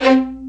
Spicato Basses & Celli; OMI - Universe of Sounds; EII Factory Library

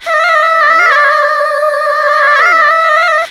REVERS FX, REVERSE FX, Funky Vocals; USB Soundscan vol.18; AKAI

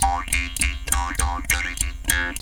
JAW GROOVES, Didgeridoo; Big Fish Audio - Didgeridoo and Other Primitive Instruments

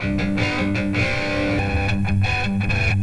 FILTER guitar; Big Beat Samples & More; AKAI

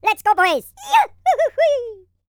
YODEL YELL, World Voices

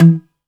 BOUZ PIZZ E 1

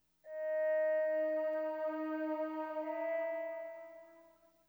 SYN, Hip Hop and R&B Drumloops; Best Service; ProSamples vol.01; AKAI

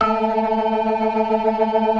DRAWBAR VIB, Synth and Organ

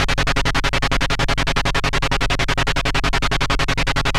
machinegun, analog synth; AKAI S6000 CD-ROM - Volume 6; Snth FX; ANALOG SYNTH SE

